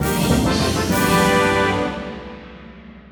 Winbattle; AeternoBlade

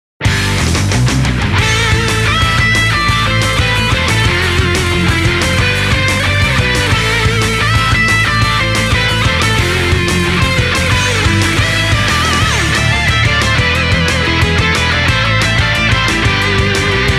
Middle Bonus BGM; pachislot tenka muteki sarariiman kintarou

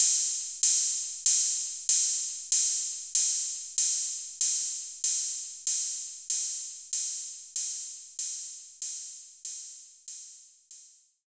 TR 808 Hat; knights try

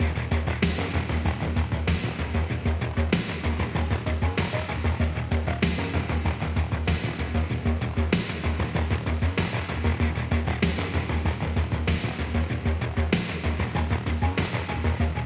synthwave loop with drum stinger 96bpm; hot lava; ios macos windows

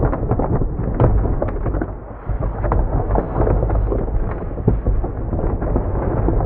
block scrape rumble; Level4 Hidden World

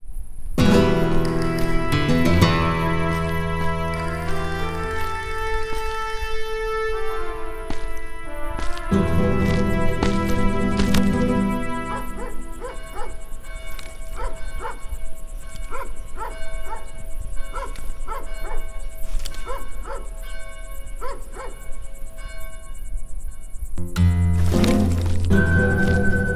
Miners Shoot

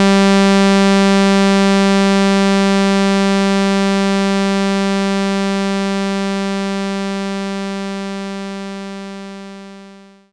sawtooth G; knights try

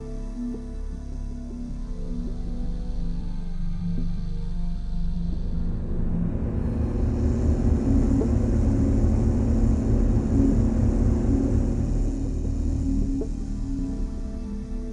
sounds music; Halo Combat Evolved; Xbox

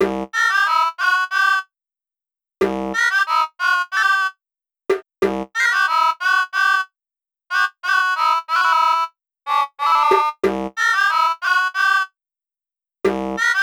Clock - Alarm; Animal Crossing Clock - Alarm 2; Nintendo DSi

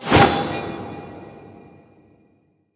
Arcane Magus Teleport spell; Linirea

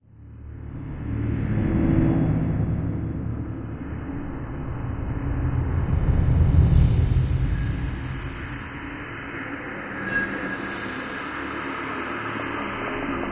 Pause Menu Music

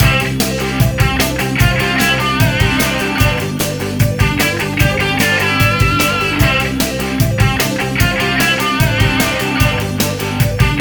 Opponent Down; Major Circuit

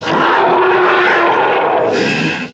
Dragon Birth

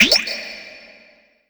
Coin In

